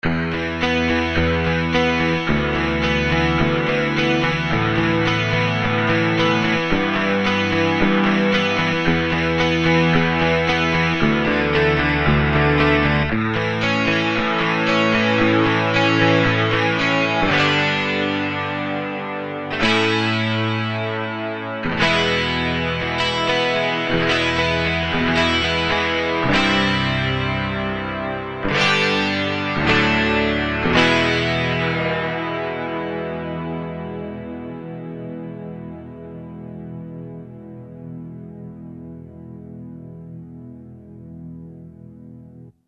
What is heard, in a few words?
Strummer DistGtr, Kurzweil K2000; Kurzweil K2000 Contemporary ROM